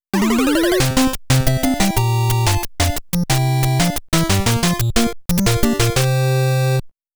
pattern clear BGM; konami famicom chronicle vol.1 disk system edition

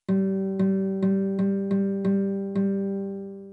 harp tuning; dragon riders chronicles of pern 2001 dreamcast gamerip

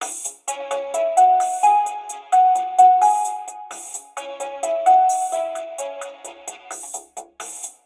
Elevator Music; Jydge

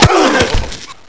Death; blockade classic

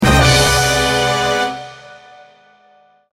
Jingle Fantasy

